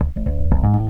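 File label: bass riff; INSTR LOP; E-Lab; X Static Goldmine 2; akai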